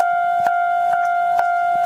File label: crossing; tetsuon